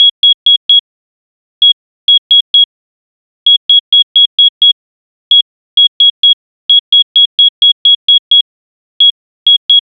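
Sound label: no dialogue just the beep, telephone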